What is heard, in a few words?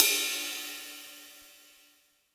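CYM RIVET ride; Cymbal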